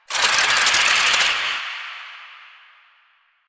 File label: Reload; Warframe; Akarius Prime